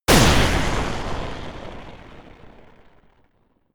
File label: Medium Collision